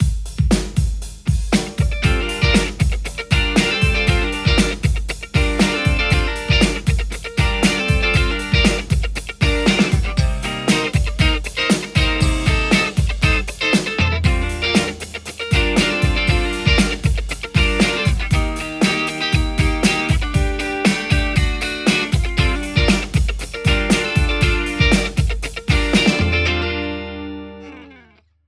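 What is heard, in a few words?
Telecaster JCM800 hot; mercuriall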